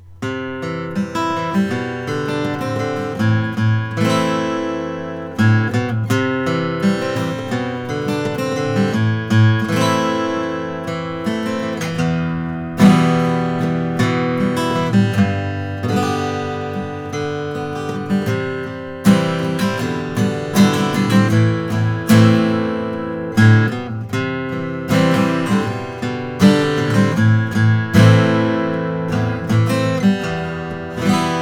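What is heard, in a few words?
Huss Dalton custom Adirondack; 2008; natural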